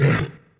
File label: hurt; FlyForInsanity